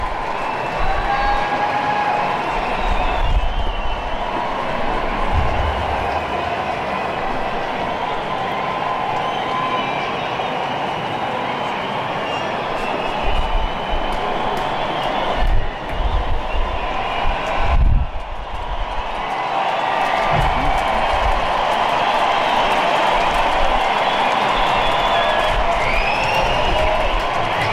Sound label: Crowd; Audience clapping; Perth, Australia 2019; Perth Australia Optus Stadium